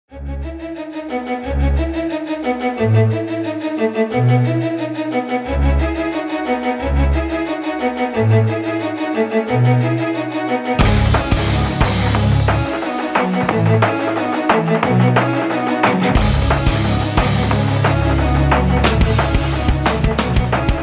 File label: Pop Rock